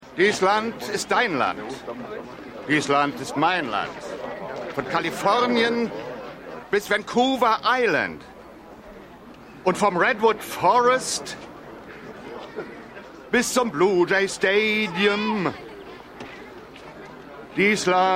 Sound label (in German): Gesang; Holling Vincoeur; AusgerechnetAlaska